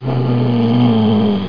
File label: zombie idle